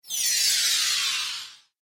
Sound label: Christmas Spell, Magic Sound Effects